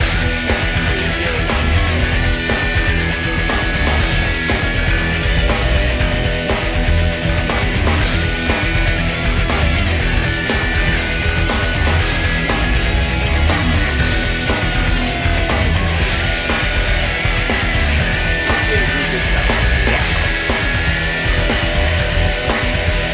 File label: intensity loop